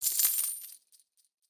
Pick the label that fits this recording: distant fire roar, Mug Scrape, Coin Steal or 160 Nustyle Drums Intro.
Coin Steal